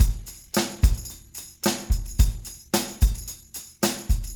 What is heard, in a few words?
110 CHERIE bt + tam